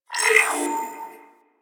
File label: Rolo Dex Select, Corpus Ship Props Ambience; Warframe; Corpus Ship Remaster